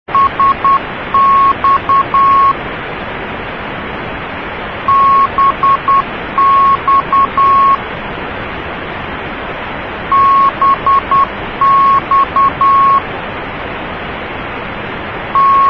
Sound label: cw